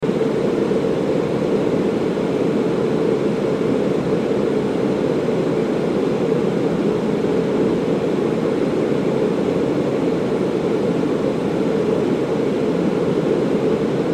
Factory Furnace Run Loop; Furnaces; Household; Industry; Blastwave

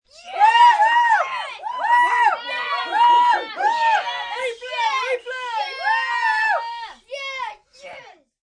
Kids Cheer Woohoo, Cheering, group of children; Crowd Sound Effects

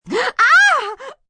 Pain Crying Ah, Pain, female, gaspy scream; VoiceBox

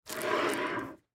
Candy Dish Slide; SoundEffects-Blastwave

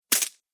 Treasure Coins Drop; Cartoon Sound Design

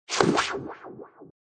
Scifi Production Element Zap Twirl; Lynne Music

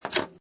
Pinball Sound Ball Move And Is Hit By Metal Plate; Video Game Sound Effects

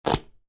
Velcro: Peel, Fast; Miscellaneous Household Sound Effects; Miscellaneous Household (Sound Effects:Household); Stuart Duffield

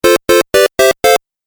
Game Music Phrase; Video Game Sound Effects

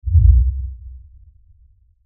LFE Tremor, Lfe Tremor Low Bass Shake Rumble; Rumbles; Blastwave; Sound Effects:Sound Design

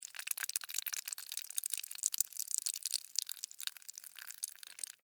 Blood Drip Fast, Stream; Horror; Blood and Guts; BlastWave

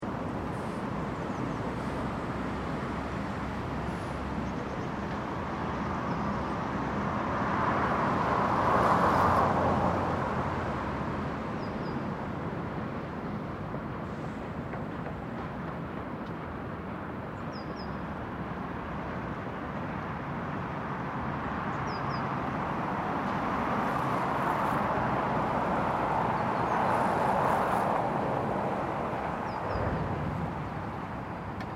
Construction Road Traffic, General city ambience; Distant construction from other side of medium traffic road; Hammering and light hits from constr; Construction Sound Effects

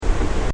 Sci fi machine loop, Scifi Machine: Loop, Short

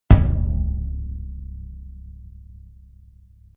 Impact Low Tom, Impact hit for music production

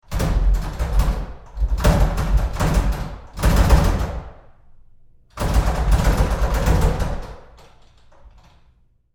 Door Bathroom Stall Handling Roomy Rough; Bathroom Sound Effects; Door, Bathroom Stall, Handling, Roomy, Rough, Short

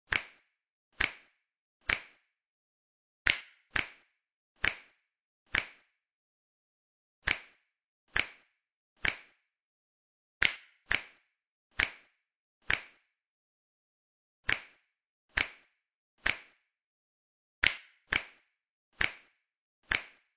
Finger Click Loop

